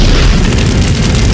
fire gecko flame; npc

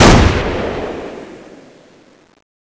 fire; tfa cso; scar oz

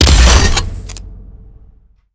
gauss siege fire; doom gauss

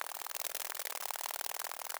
hornet idle

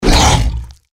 deathclaw strike; npc

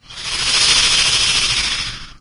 breath, imp; doom3

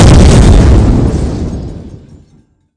explosion, impacts

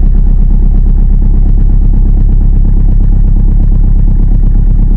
v8 elite idle

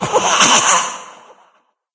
chatter combat, cherub; doom3

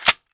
mag tap, weapons fiveseven

